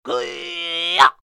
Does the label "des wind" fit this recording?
No